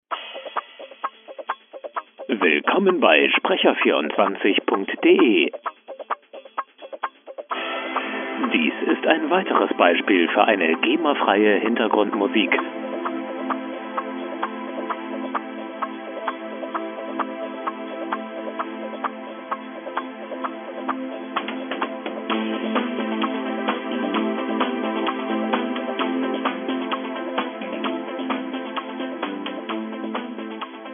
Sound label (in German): musik